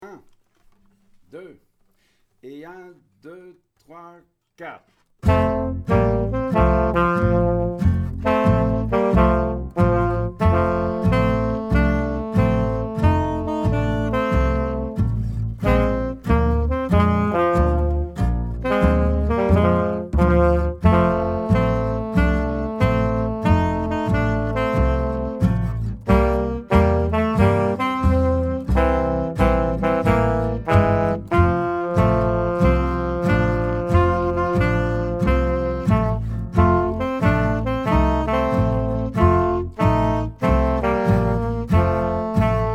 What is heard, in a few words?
lets begin 2 saxs